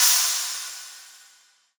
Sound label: cymbal sample g key